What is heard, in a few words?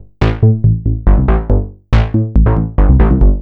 Synth Bass; Basses